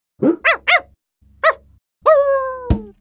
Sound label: prize idle balloon dog; Prizes